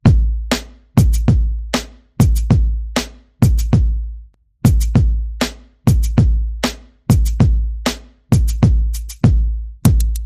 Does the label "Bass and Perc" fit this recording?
Yes